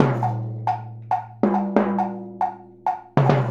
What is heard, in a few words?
Wood Block and Timbale